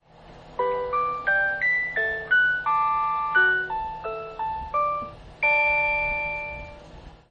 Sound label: chime